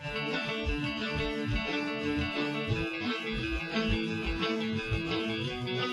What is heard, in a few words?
Groove